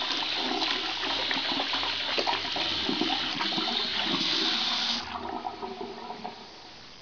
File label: wash hand; Image Bonanza; Expert Software; househld